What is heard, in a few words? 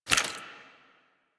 Generic Footstep; Warframe; Warframes; Dagath